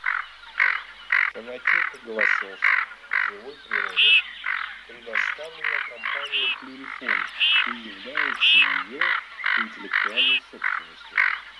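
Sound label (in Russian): Гоголь Quattrocchi, манок; plurifon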